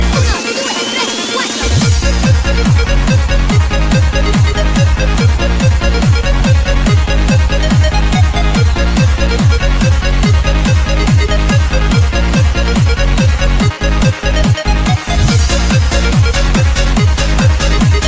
Electro Techno Beat

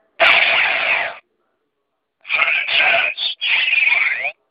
Full Charge

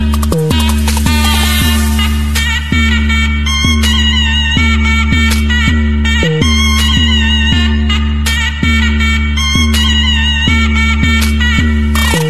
zurna

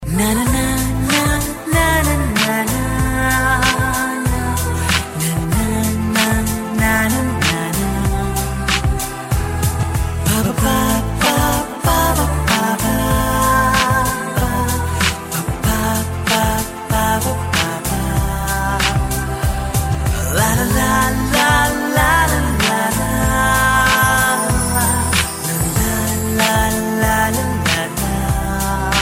Tamil Bgm; 4 Lovers

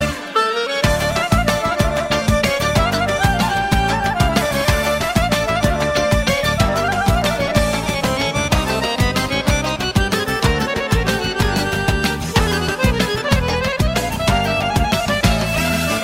Clarinet Dance

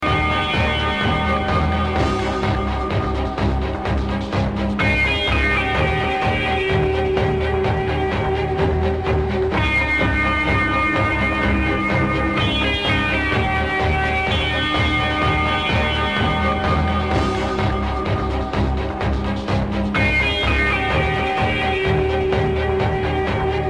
Horror Tone

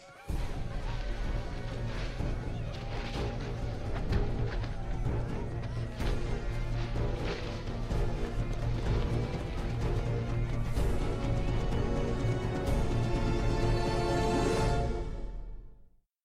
Dramatic

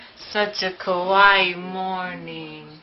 Kawaii Morning

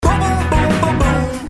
Boom Boom Text

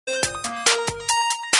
Reset

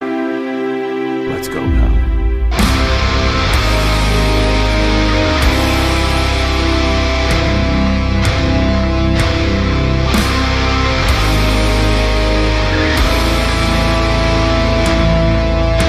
Metal ringtone

